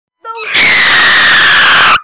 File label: screaming funny